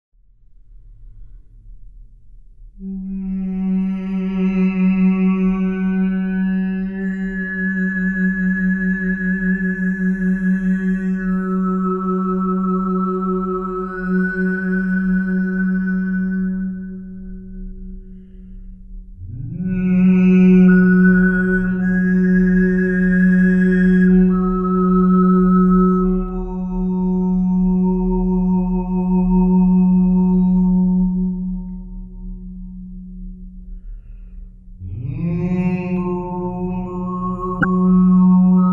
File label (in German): obertongesang; stereohr